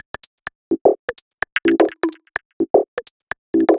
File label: Pompoms 127bpm, House Drumloops; 90 s Sample CDs; Zero-G House Fabrik Drumloops; ZG Drumloops